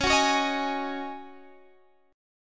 C sharp Suspended 2nd Suspended 4th, Guitar, Half Step Down